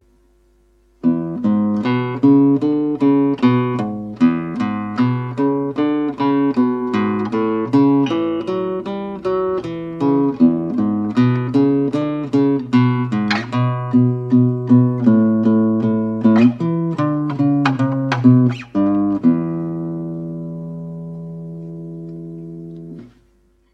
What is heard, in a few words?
Boogie Bass